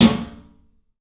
club snare, 3D Drums; 3D Sound

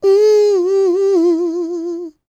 A MMM, Vocal Blues Soul; Spectrasonics; Planet

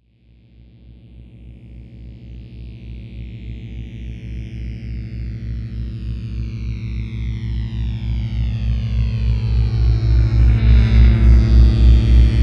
PULSATOR; AKAI Anime Sound FX; FLYOBJECTS

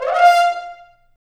BRS French Horns; Roland - LA Composer vol. 1

